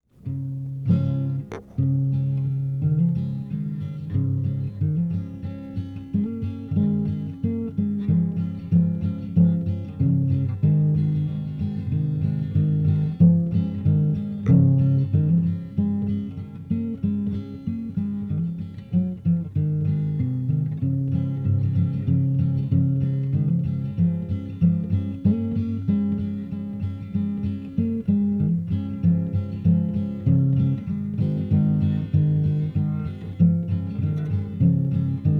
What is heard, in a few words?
Instrumental